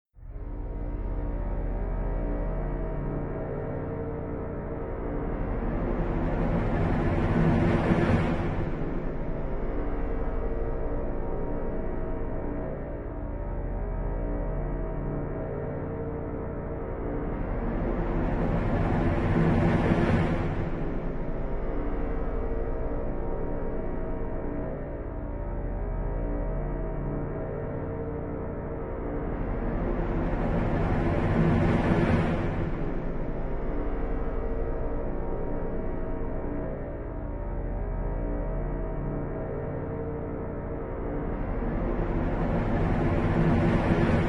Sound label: music